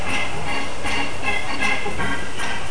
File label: Start sound; SpaceCamel